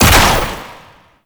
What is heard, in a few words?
Ranged Weapon Fire; Warframe; Necramech; Weapons